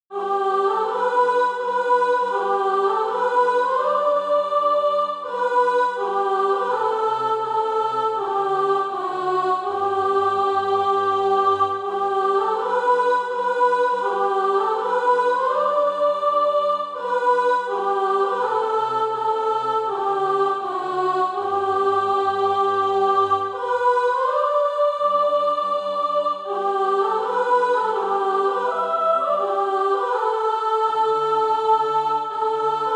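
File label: soprano, Practise Music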